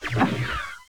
zap down; Neverhood

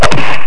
Wapouch; Grizzly

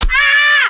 die; Immortal

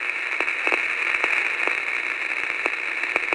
radio; Mega Game; Team Alligator

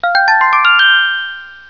Sound balloon

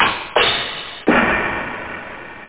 Crash Cymbal 2

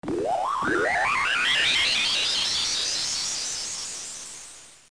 Electric - Recycle

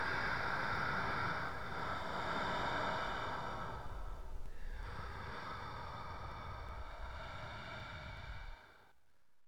breath

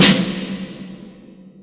snare amb; SpecOps2MPlayerDemo; Music

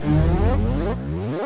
PROMOTE; PlayPac

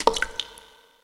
drop; diver jimmy

